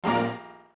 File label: combo; Puffer Popper